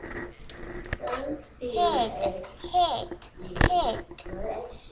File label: hit